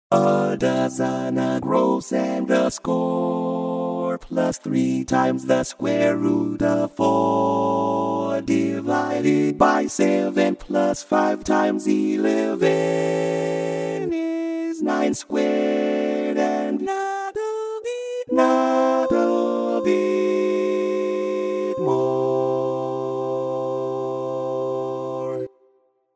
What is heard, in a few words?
Limerick All Parts